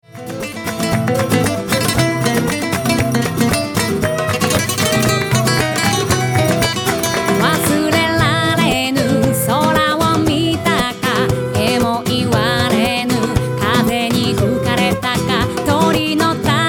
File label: flamenco